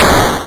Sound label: death; PinmikPanik